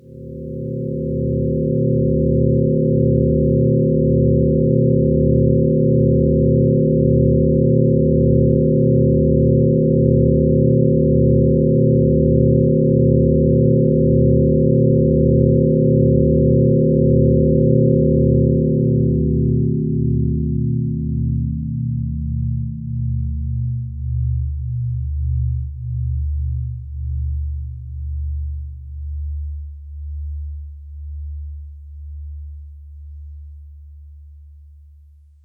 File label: bell synth; Max